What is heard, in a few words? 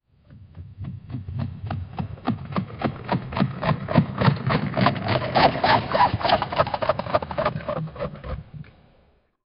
combination of horse and, animal roar